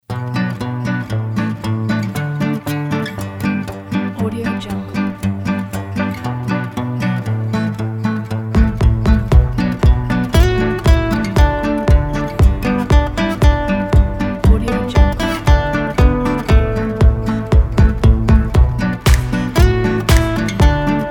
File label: indie folk